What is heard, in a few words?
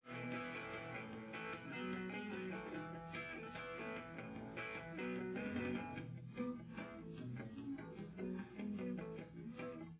wdrums liverec 250ms 5cm sim